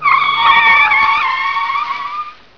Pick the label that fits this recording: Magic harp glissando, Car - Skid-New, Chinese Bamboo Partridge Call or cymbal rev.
Car - Skid-New